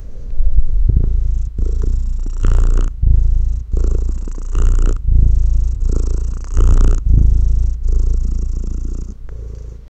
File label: a soft steady purr rumble, domestic animals